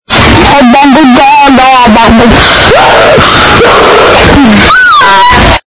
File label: abbagadadaooohhhaeaeaehh